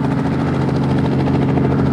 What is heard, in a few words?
Helicopter; Unity